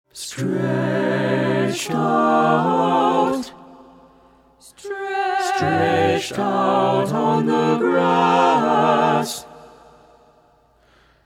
SATB

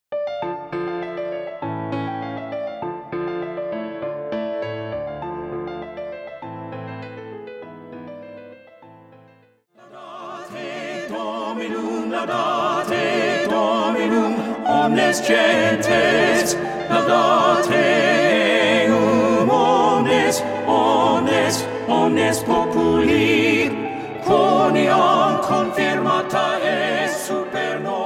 Laudate Dominum Omnes Gentes Alto

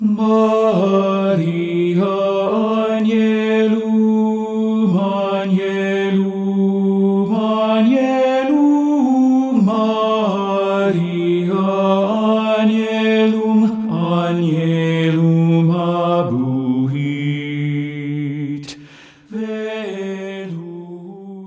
Gregorian Chant

